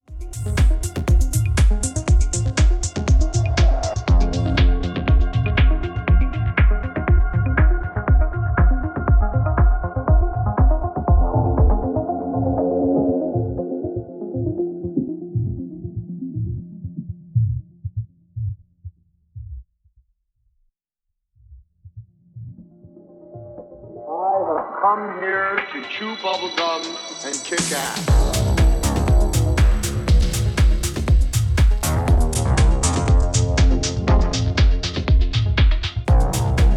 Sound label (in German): LP mittel reso